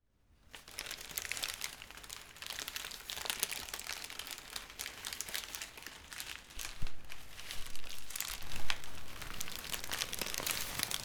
Slow Crushing of the Paper Bag

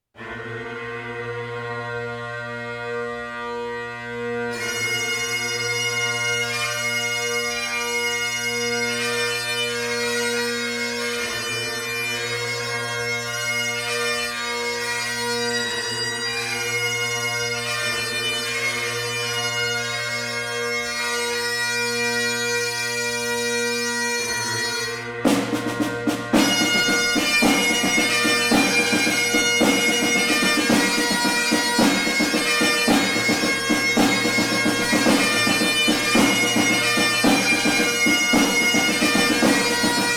Bagpipes Song

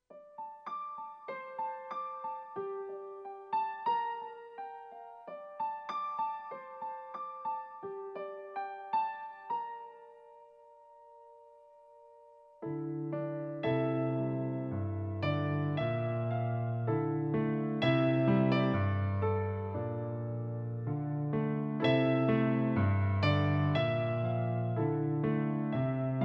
instrumental

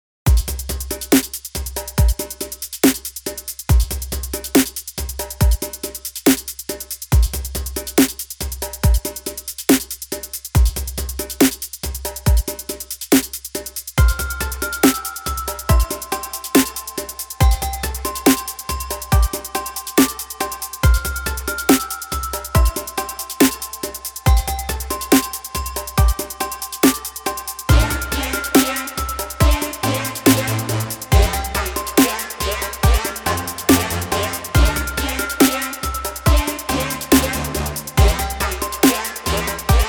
Riffs

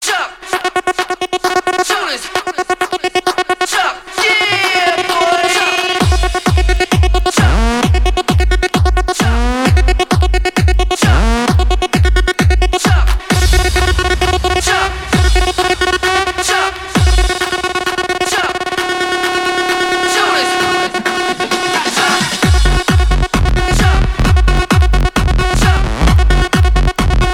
ELECTRO remix

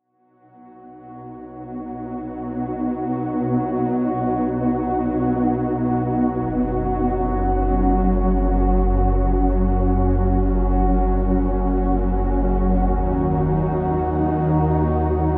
741 Hz

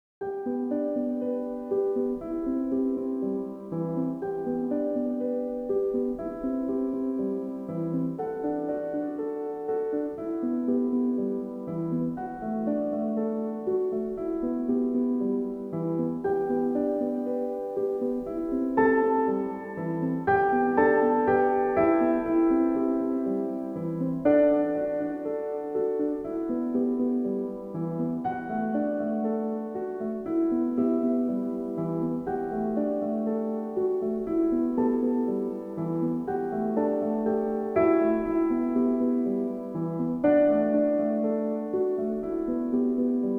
Piano Chillout Music

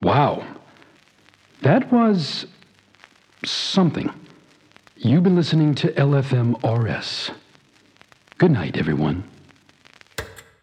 Radio Band, Outro

